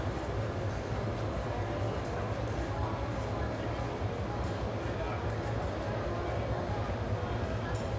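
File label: escalator in a shopping centre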